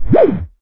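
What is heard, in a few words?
synth effect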